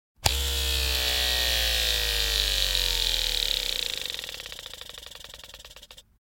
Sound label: Electric Shaver Turn on & Dies, Household